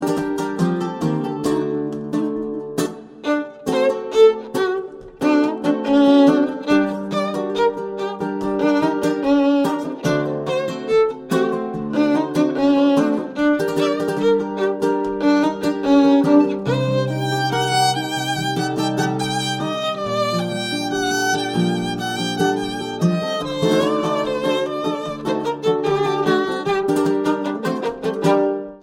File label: tango